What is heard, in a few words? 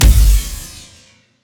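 Laser gun Flange Cannon Fire; Lasrgun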